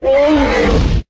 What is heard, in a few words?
T-Rex Roar